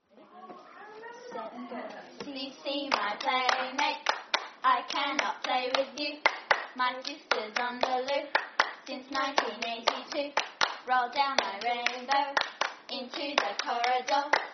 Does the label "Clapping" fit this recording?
Yes